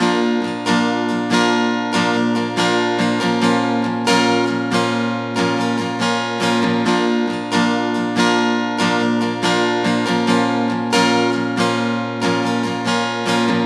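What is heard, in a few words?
Guitar 140 BPM G minor; Guitar Loops; Signs; GhostHack; Ghosthack Advent Calendar 2021 - Day 10 - Guitar Loops and One-Shots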